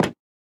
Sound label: toggle, cherrywood trapdoor; block; minecraft